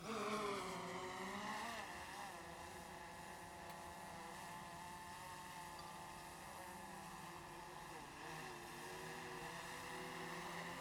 Drone In Flight

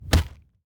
Punch

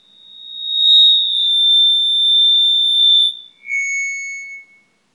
Mic Feedback Long

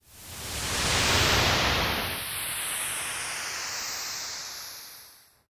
Whoosh

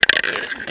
Marble

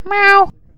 Voice Meow

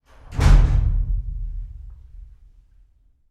Big Door Shut Down

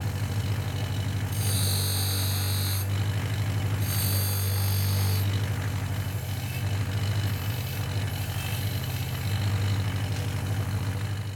Key Cutting Machine

